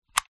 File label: plastic slide toggle switch; foley